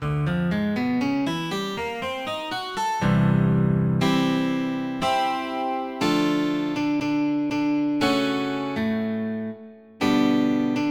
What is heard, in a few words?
12 String